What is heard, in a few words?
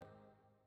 Chord Vocal, Experimental; Ableton; Convolution Reverb